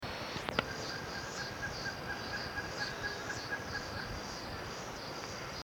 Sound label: Leptodon cayanensis, roofvogels; Accipitrinae; Grijskopwouw; Accipitres; Accipitidae